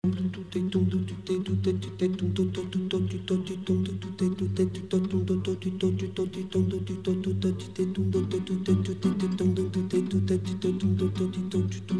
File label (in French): perc bresil, voix ethniques